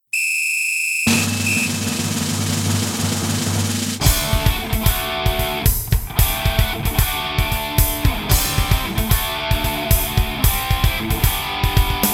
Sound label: electric guitar; music smorgasbord